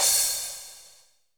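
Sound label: cymbal, real mega drums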